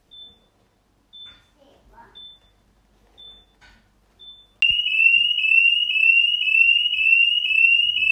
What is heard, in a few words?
minut sirena, smart home sensor